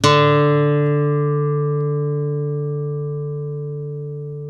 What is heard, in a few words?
ACUSTC D 2, ACOUSTIC guitar; East West; Steve Stevens Guitar Samples Collection; ACOUSTIC PCK